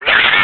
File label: Shock; Link getting shocked